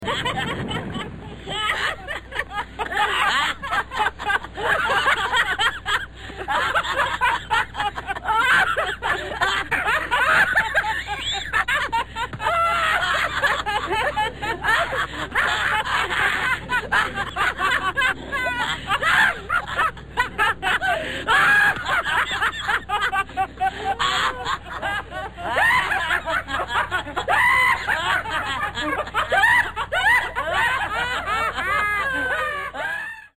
Laughing; Stooges

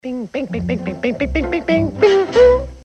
Ping Ping

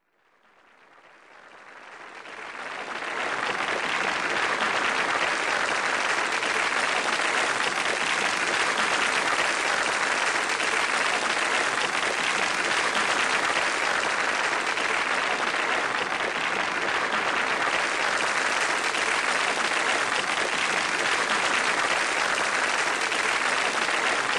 Crowd applause

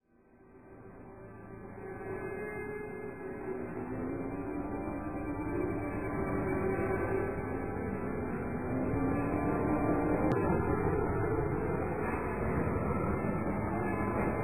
ethereal, Halloween

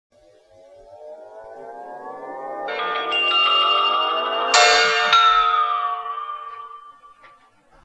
Big Bell; Book and Candle

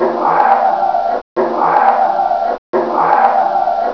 scream; EVP

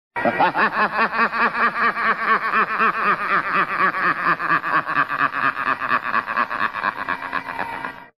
gorgon laff; Halloween

